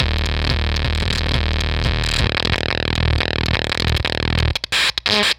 Fuzztest drum fuzzfactory

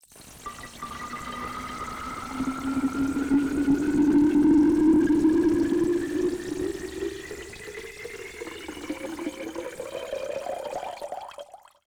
8-bit aquatic fill up dynamic; ambience and background fx; nova sound

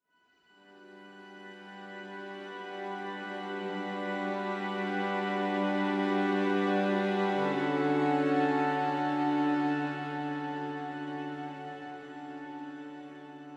flux, whisper strings; bunker samples

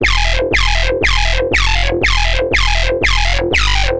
riddim timewarp; ultimate vital bundle; rocket powered sound

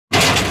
scrapyard hard metal slam; nova scrap yard; junk yard metallic and impact fx; nova sound

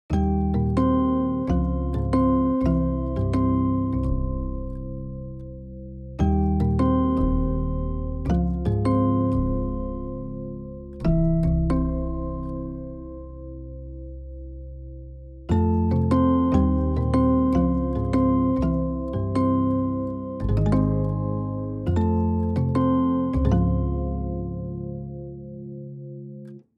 glockenmaschine music box; schallenberg engineering

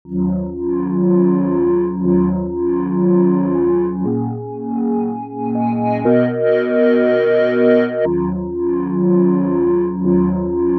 sustained sine wet; chiral audio

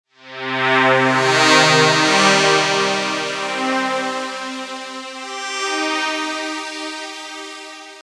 bowstring; firefly synth 2; sjoerdvankreel

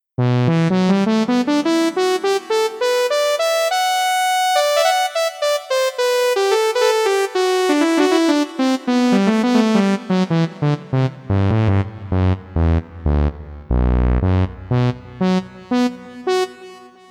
poly lead; the transmitter soundset

